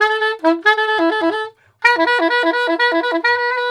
SAX, Balkanish Traditions

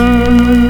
VIBRATO RGN; Big Beat Samples & More; AKAI; KEYBOARD KIT

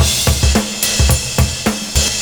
CYMB, Breakbeats

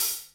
MEDIUM HH, ACSTC DRUMS; Pro-Rec - Synthsonic

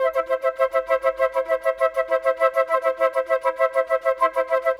Flutes, Orchestral; Sonic Foundry (Sony Creative Software); Orchestral VOL-2; Modern 22

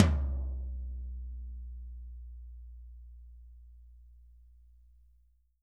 T14 F R, TOMS; Living Drums; Sampleheads; Peter Erskine; PB KICK+TOMS

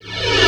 Rev Flang; Quasimidi Rave-o-lution 309

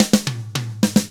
POP, Drums

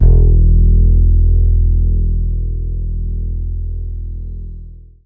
upright (E), bass; Sonic Foundry (Sony Creative Software); Ugly Remnants VOL-2; Basstuff

